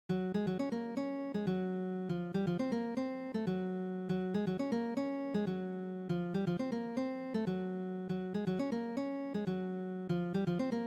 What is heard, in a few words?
rhythm example